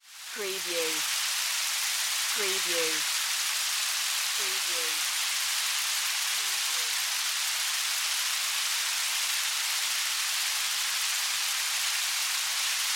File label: Nature Weather Rain